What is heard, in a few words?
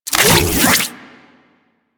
Briefcase Katana Equip; Crp Briefcase Katana